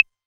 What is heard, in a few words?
Clave, Novation Drumstation